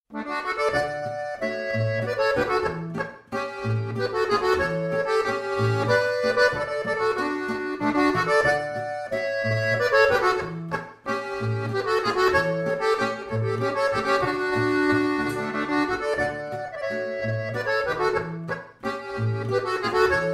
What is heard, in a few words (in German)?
volksmusikstueckl